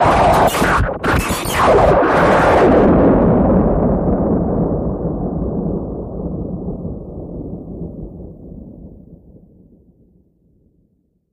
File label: Ah Pedal Steel Elect, arcs & sparks